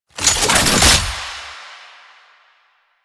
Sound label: Gunbrella Reload, Weapons; Warframe; Tenno; Koumei